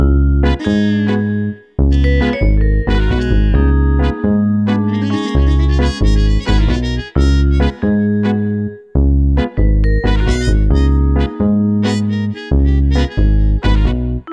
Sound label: Jazz